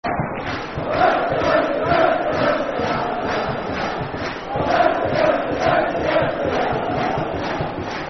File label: Bouncy, Bouncy, Football Chant (Soccer Song), N. Ireland